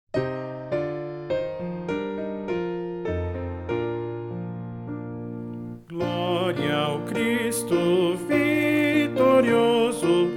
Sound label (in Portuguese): voz piano